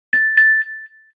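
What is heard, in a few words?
news ding, iphone reminder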